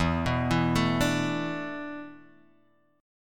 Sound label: E 7th Suspended 4th E down Guitar Half Step Down